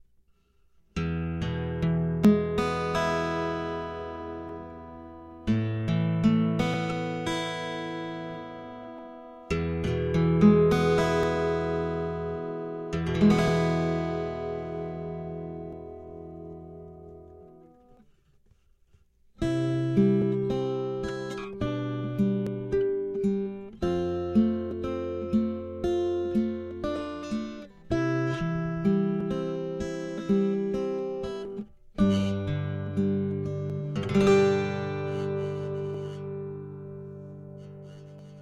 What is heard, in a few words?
line, acpickup